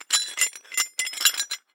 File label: Hole Saw Rattle, Hole Saw Bits